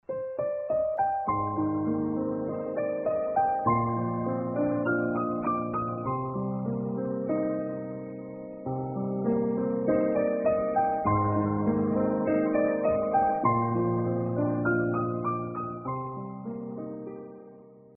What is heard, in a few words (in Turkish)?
SANTRAL MÜZİK